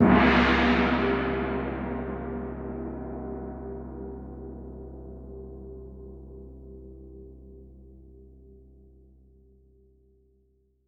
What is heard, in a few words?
Gong - C; percussion; Cymatics - Infinity Beta Pack 2.0; Zeus Percussion Collection